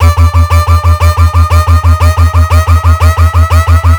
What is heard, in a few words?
Sound FX Trap Synth Rise